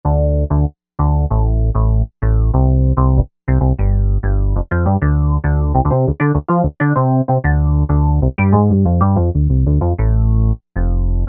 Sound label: Phaser